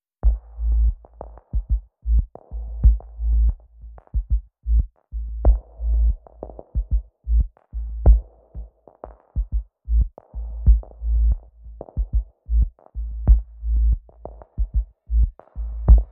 Drums and Bass Mix

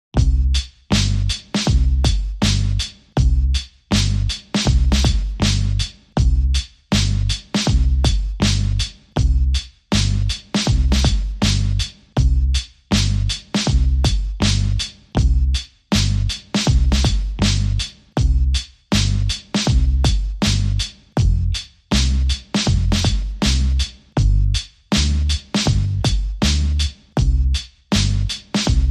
Drums and Bass

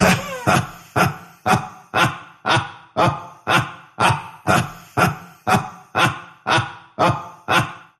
Male Laughing Rhythm

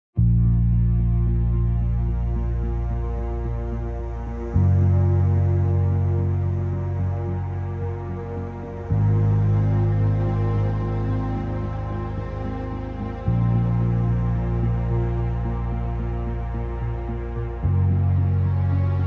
No Glockenspiel No Synth Pads Mix

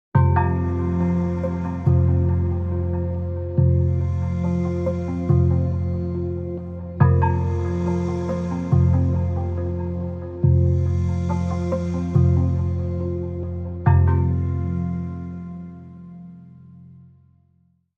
Dark Chime Meditation, Bumper

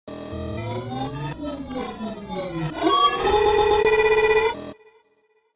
FX Phase Tv

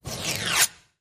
Ribbon Curl

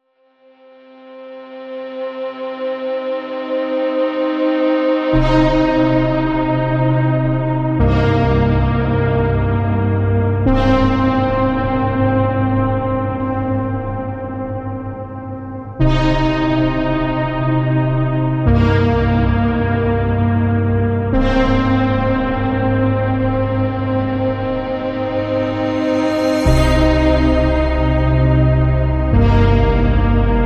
Cyber Connections, No Plucks

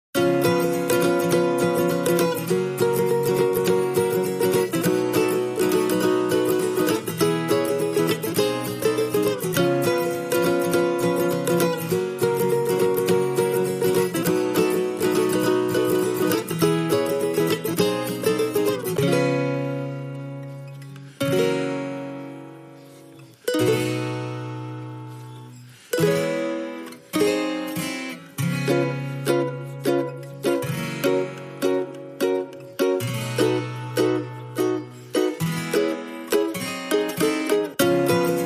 Guitars Only

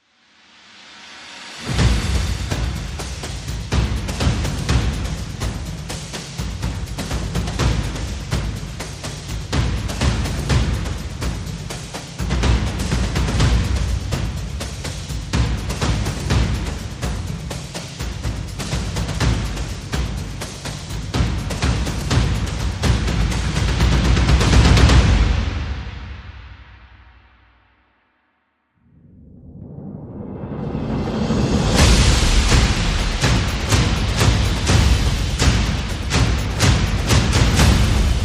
Percussion and Effects

